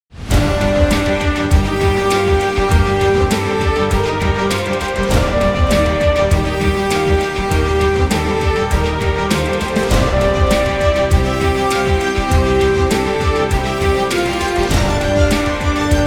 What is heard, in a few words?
News Demo, MELODY; CBS